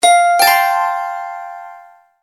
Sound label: correct